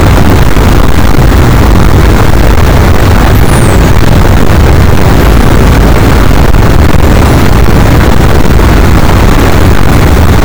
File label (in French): cachalot positif, boat, Physeter Macrocephalus